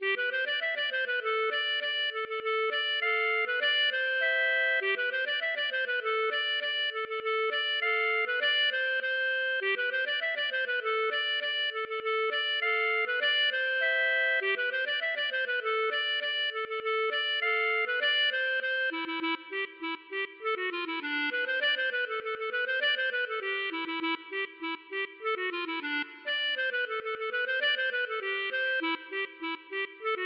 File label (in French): aeroplane; Robinard